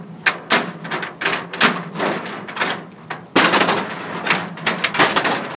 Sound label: Lock; East Harlem